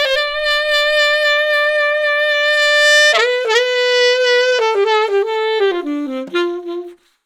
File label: DISCO SAX, 124 BPM; Drumdrops; Compact Disco